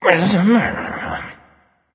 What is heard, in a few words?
grumble; smite; kukulkan